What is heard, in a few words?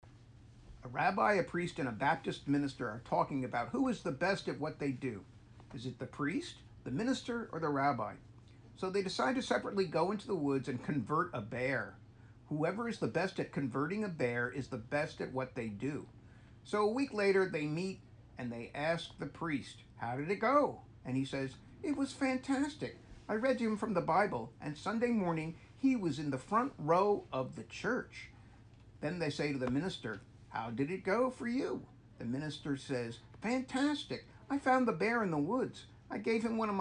Laugh Track; Mark Schiff; Joke